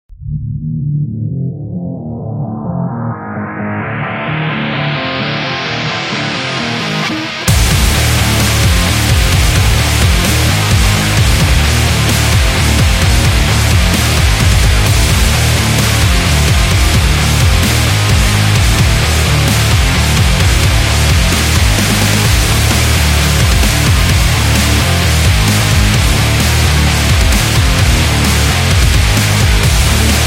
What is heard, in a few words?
Workout